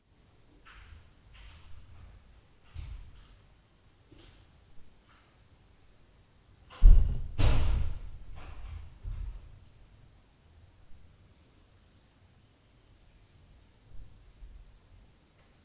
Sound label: DOOR; Night Noise